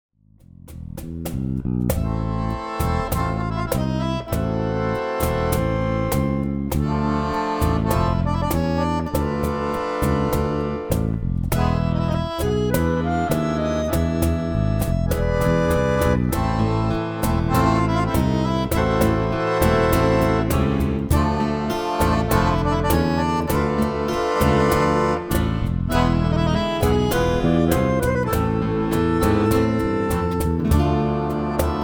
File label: Akkordeon